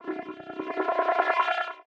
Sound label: Interface Screen, Sci-Fi Space; Interface Screen 5